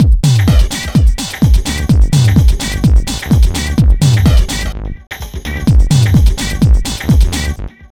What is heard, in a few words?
Fresh Disco House, 127 BEAT; USB Soundscan vol.20; AKAI